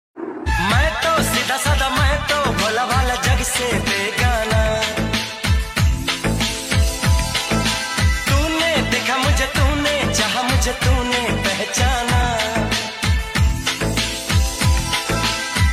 romantic song; maharaja

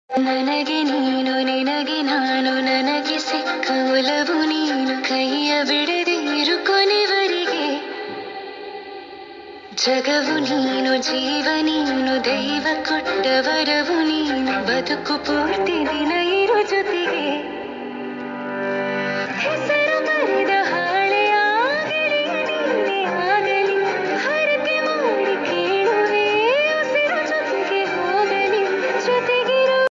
kannada new song; upadhyaksha movie song

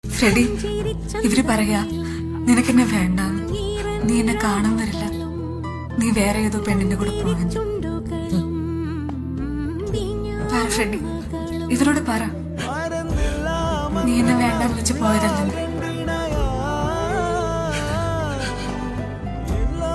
sad malayalam

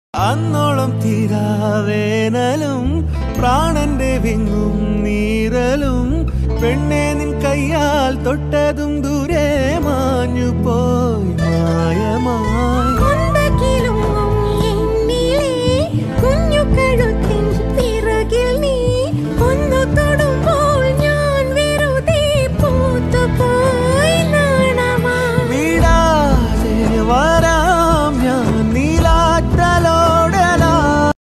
love song, Malayalam love feel song

